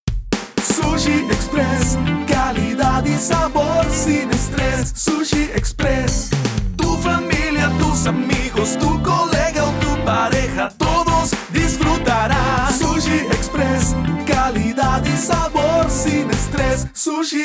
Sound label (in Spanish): JINGLE Institucional; Sushi Express